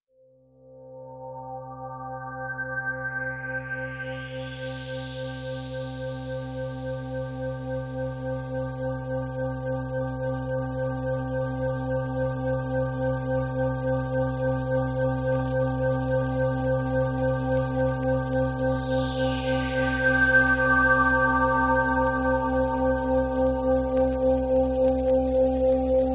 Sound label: Synth Vocod EQ